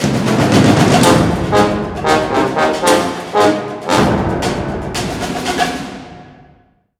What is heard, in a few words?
String; The Bureau XCOM Declassified